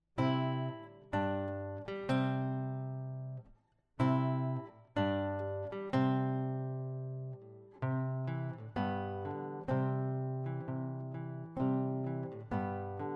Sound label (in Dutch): Gitaar